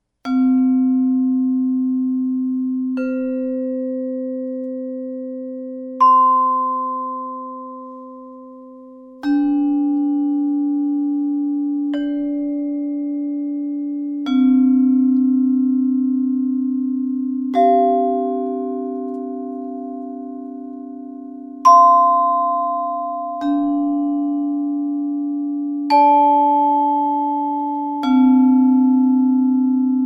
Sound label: Cristalllophone Fa 4